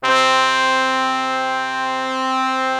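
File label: BRASS C3 left, ORCH BRASS; Greytsounds VOL-1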